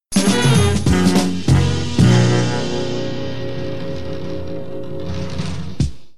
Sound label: jingles image pack remix fast band track